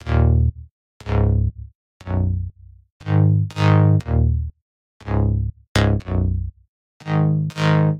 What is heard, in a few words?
Bass, Dancefloor Pop; Remixlive